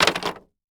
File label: Impact Wood Pallet Drop On Plywood; Impacts